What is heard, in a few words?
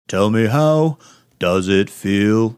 singing tell me how does it feel